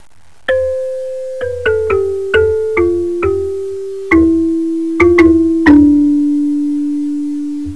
kalimba

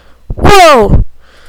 woah

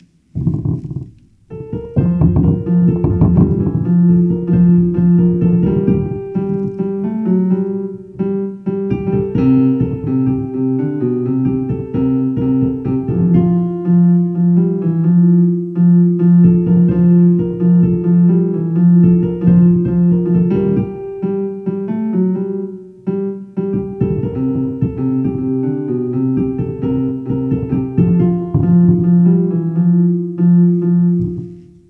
piano; viridian

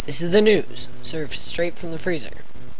ooh; Brickshelf

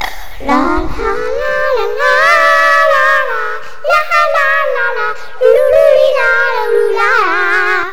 lalala

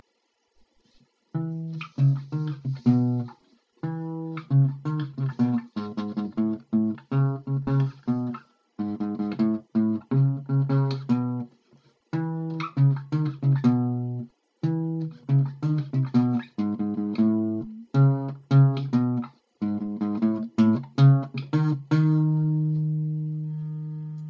melody

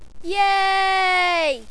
yay

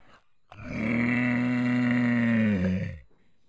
dragon roar; Brickshelf

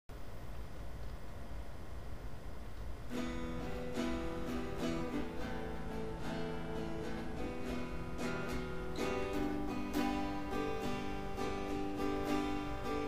guitar

